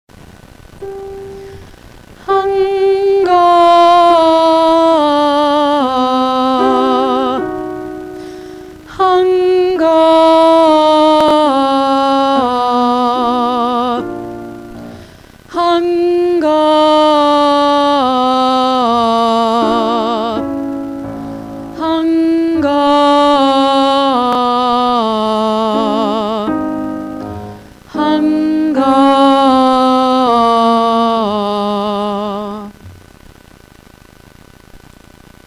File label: Warmup, Vocalization